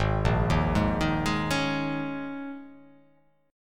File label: Ab Suspended 2nd Suspended 4th, Absus2sus4 chord; arpeggiated; JGuitar